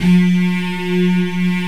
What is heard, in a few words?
STRING ENSMB; Greytsounds VOL-1